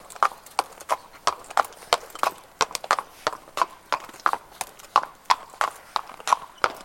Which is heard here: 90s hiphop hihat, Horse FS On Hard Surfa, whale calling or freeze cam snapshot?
Horse FS On Hard Surfa